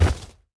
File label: enemy patrolling Vulture death